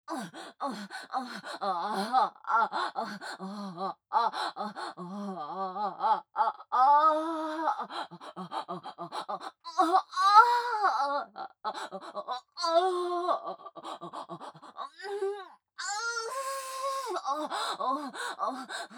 喘ぎ声・激しい; アイリス

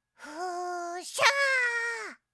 Windows ログオン

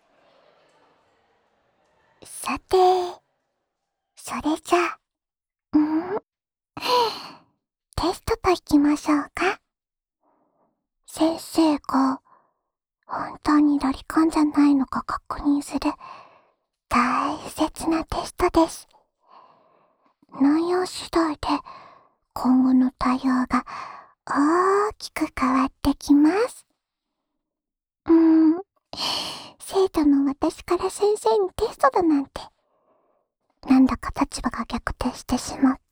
耳舐め, ASMR; 先生の本性……暴いちゃいますね？

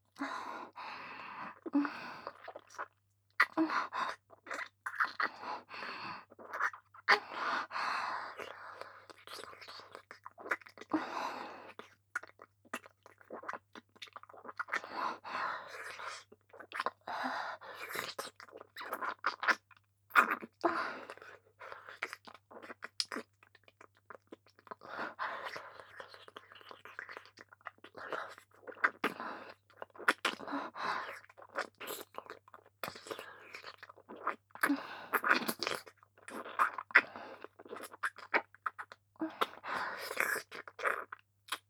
ディープキス; ポーラ（妹）

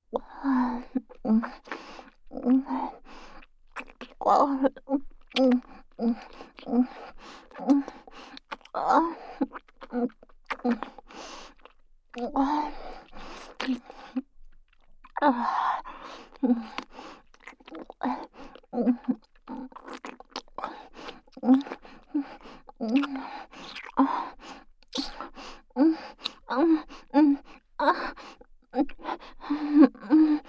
ベロチュー＆ドスケベ喘ぎループトラック, ASMR

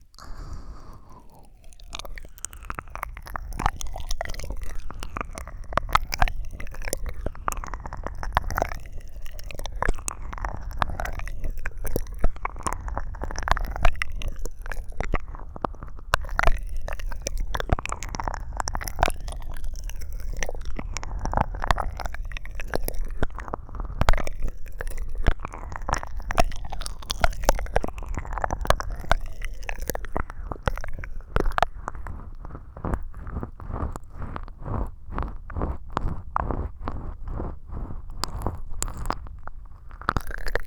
睡眠コース

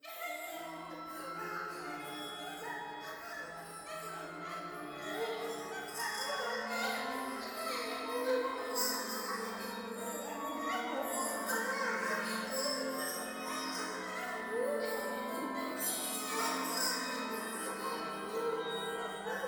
トイレ 喘ぎ 讃美歌

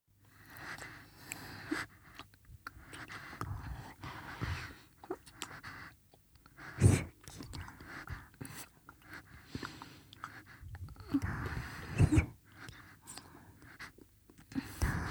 乳首舐めループ音～レロレロ速め

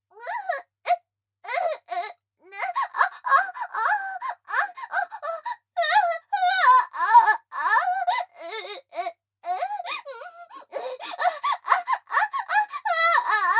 クンニ・喘ぎ・通常; アスティリア

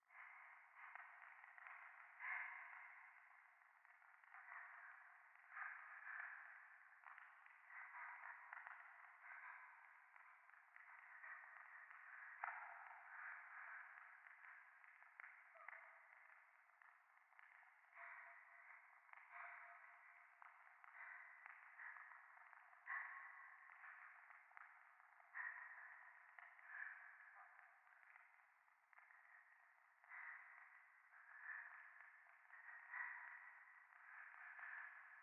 廃墟 左耳快感吐息 暖炉

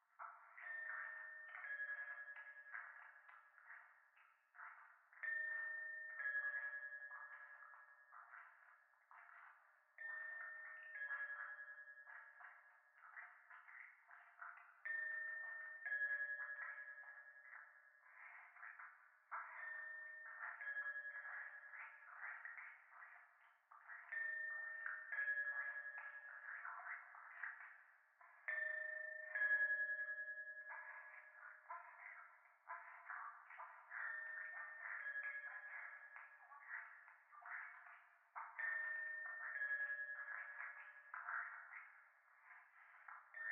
教会 フェラチオ 神社

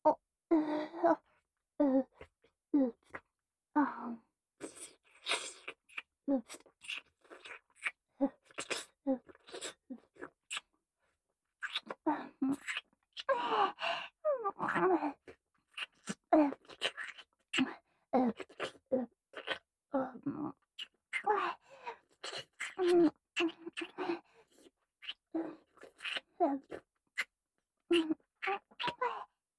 フェラ 舐め

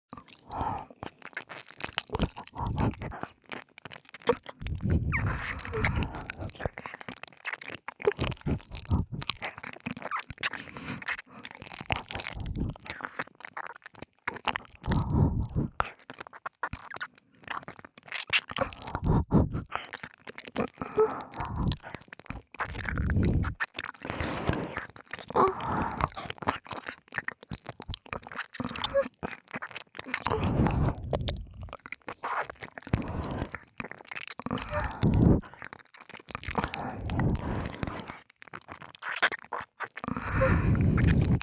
(左)ねっとりしゃぶりつき耳舐めエッチ, ASMR; 黒耳; 効果音無し版

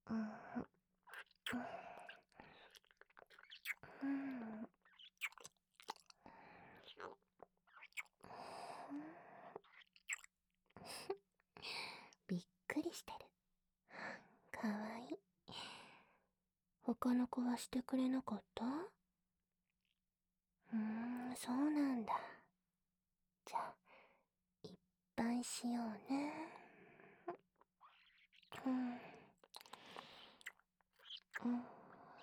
濃厚キスとささやき耳舐め, ASMR; No.1あおいの余裕たっぷり

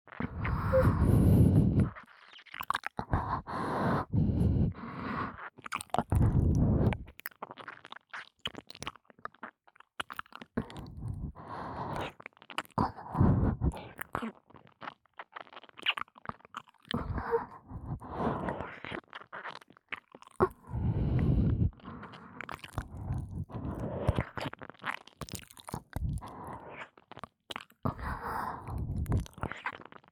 吐息たっぷりまったり耳舐め, 効果音無し; KU100; 舐め音比べ

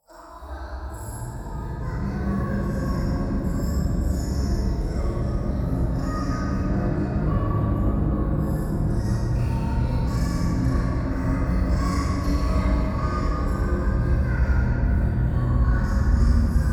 廃墟 低音喘ぎ 車の中

